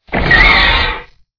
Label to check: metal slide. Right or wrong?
Right